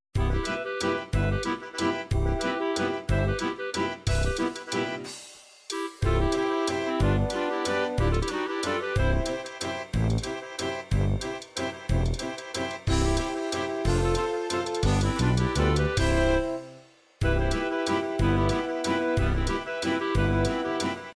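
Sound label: psr9000 german waltz, midi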